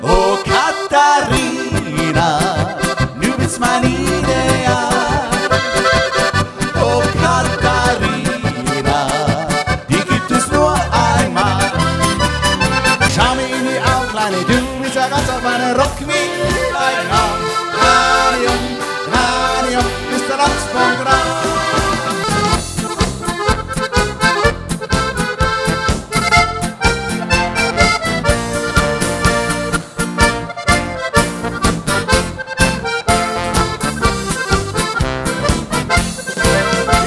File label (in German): volksmusik